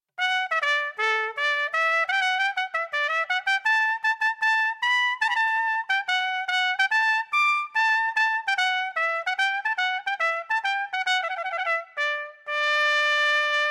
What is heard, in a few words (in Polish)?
trabka